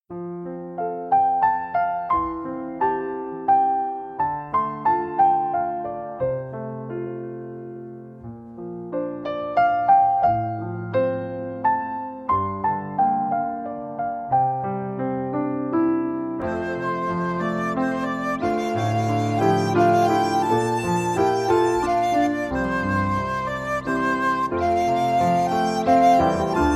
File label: Arirang accompaniment in C (ukulele)